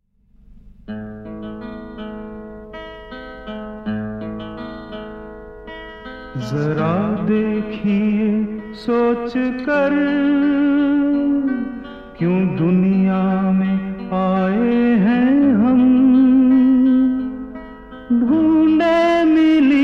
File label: Solo